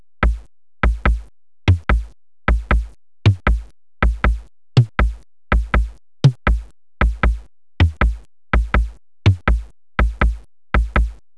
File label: Elec Bass